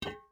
ui drop metal hollow large; Rust

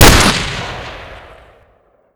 sa80, weapons; ModernWarfare

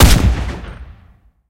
cannon 25mm 72k shot; cannons; War Thunder

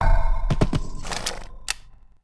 Build Cavalry; Civ4

